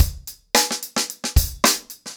110BPM, acoustic drums; The Roots Drumloops

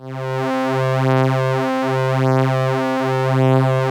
OBERHEIMS

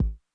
Kick Accent Low Tone Min Attack Mid Decay, 909; classic machines

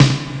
sdrum; ST-XX Amiga Soundtracker Sample Packs

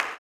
CLAP, Blues Soul; Spectrasonics Vocal Planet

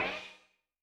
CLOUDS; Yamaha DTXpress-II

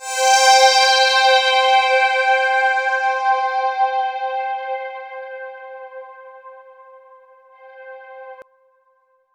C4, Roland JV 2080, POLY SAW

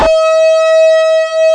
TURBO A5; East West - Global Sounds; DIST.SOLI

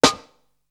HI RING, SNARES; Zero-G - Funky Elements

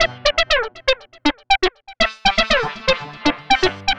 synth arp; Electrocution

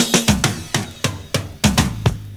FILLS, 70_s Breakbeats; USB Soundscan vol.46; AKAI

